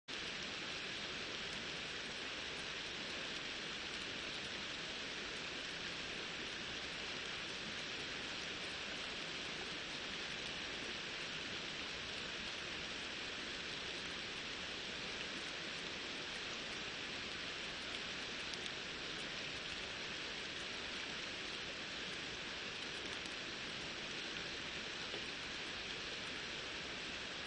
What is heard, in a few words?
riverside; Kyoto U; Ashiu